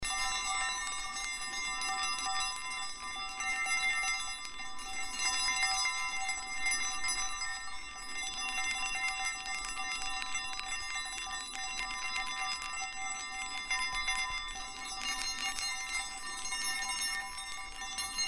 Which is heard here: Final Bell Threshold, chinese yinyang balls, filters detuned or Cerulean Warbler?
chinese yinyang balls